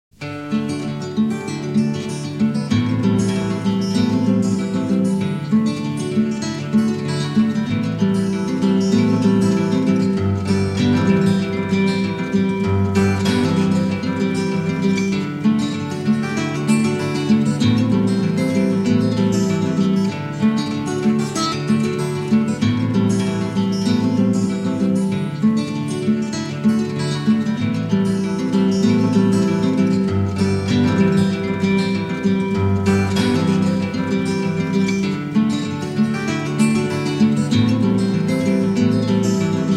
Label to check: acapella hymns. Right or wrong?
Wrong